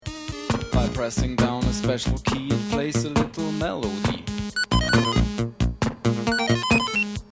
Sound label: pocket calculator